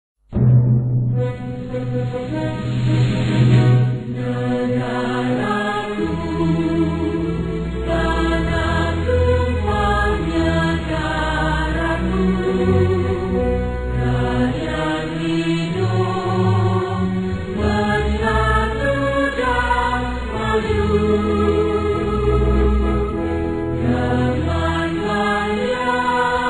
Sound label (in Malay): NegaraKu Karaoke Version; NegaraKu Video Clip